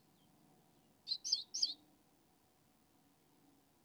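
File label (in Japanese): シジュウカラ, 【満足、満足】 メスが抱卵中 やヒナを温めている時オスに餌をもらったあと鳴く。; オスがこのように鳴くことはなく、また営巣時だけの鳴き声。; Parus minor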